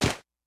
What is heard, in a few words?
Obstacle Macaron; In Obstacle Macaron